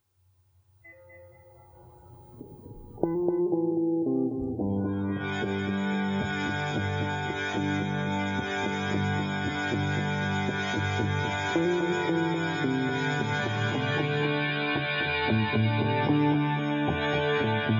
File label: more bass